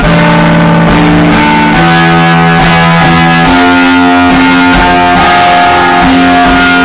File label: progression 140, Guitar samples with a lot of distortion